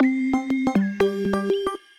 Various Rhythm Voices, Transformed Voices; Chromatic Voices; Ableton; Factory Packs; Voice Box

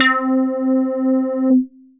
Dice Keys, Synth Keys; Instruments; Miami Dice Keys; Ableton; Synth Essentials; Operator

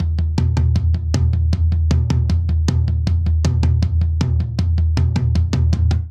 Twist Toms Only 157 bpm; 4 4; MIDI Clips; Ableton; Factory Packs; Drum Booth

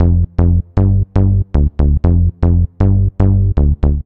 Bass (G) 118bpm; Music Loops; Ableton; Singularities

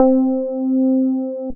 blurry wurli; Piano & Keys; Ableton; Factory Packs; Beat Tools

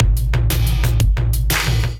Sun Wash Kit, Drums; Ableton; Drive and Glow

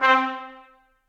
Trumpet Solo Staccato; Ableton; Orchestral Brass

